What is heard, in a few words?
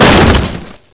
bust crate, debris